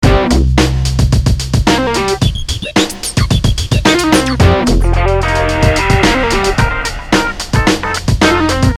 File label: depressing rock music